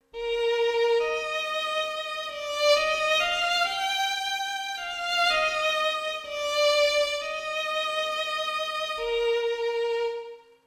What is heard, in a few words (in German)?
Violine; Artinoise Recorder App